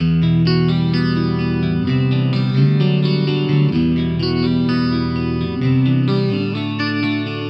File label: Electric Pop Guitar 128BPM Emin; Electric Pop Guitars; Vengeance - Essential Clubsounds Vol.4; Guitarloops